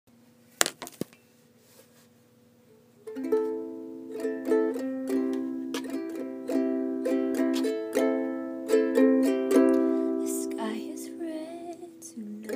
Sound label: singing ukulele; very rough uke skills